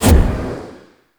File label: Operator Wraith Void Projection Enter; Focus; Operators; Powers; Wraith Movement